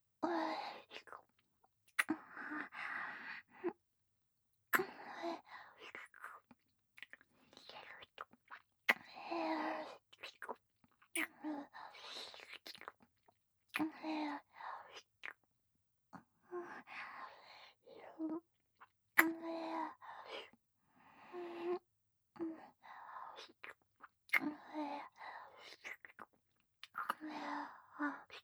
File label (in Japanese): ディープキス